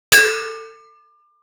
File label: fork scene utensil clink; dining